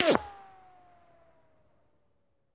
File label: Bullet Ricochet Sin